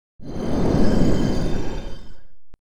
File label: magical stone slide; Som da parede a cair